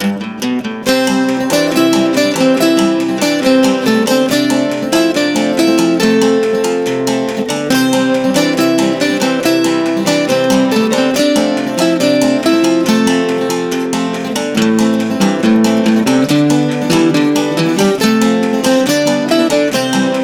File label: Happy Country Folk Acoustic